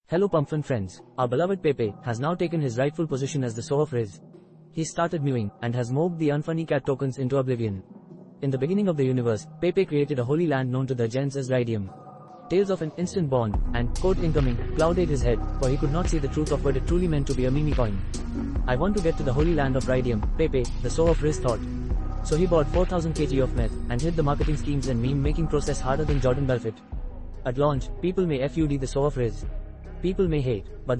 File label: song